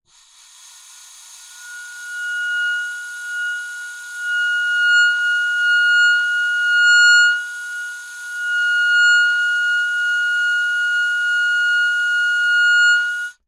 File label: Clusters, Melodica